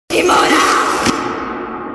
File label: echo